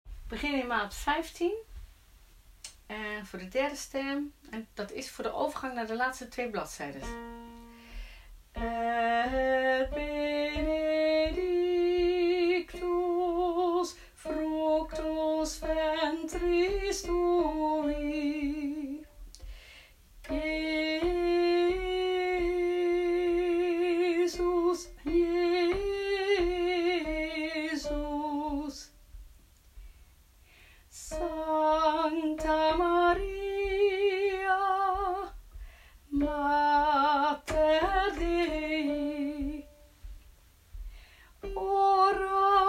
Derde stem; vanaf mt 15 tot eind

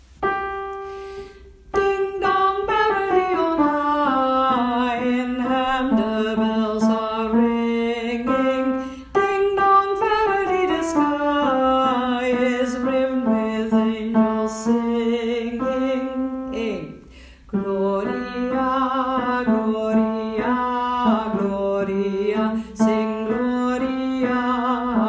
Bas

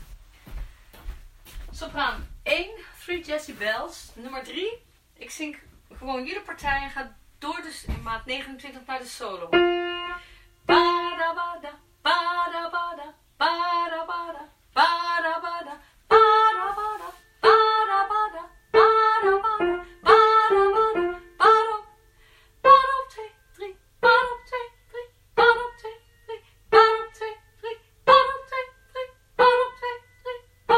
Three jazzy voor tenor solo lijn

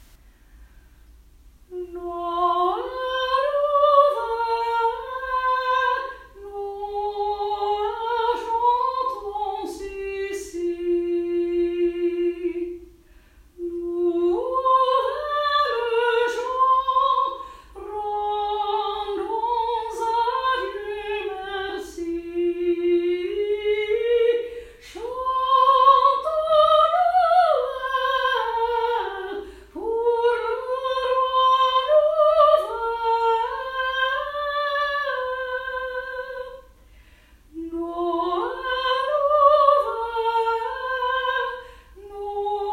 Noel nouvelet eerste stem

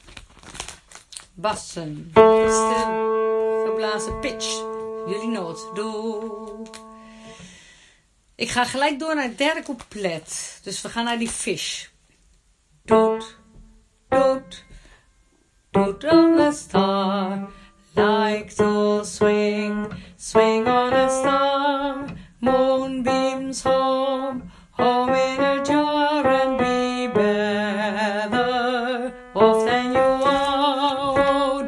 Bas

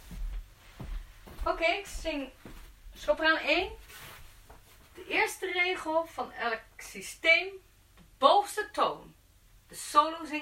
Tenor three jazzy sop lijn bovenste noten

